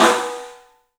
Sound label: STEEL, Drums, ROOM SINGLE; 3D Sound